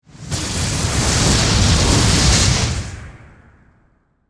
Magic Spell Build, Horror, Magic, Spell, Build, Up; Blast Wave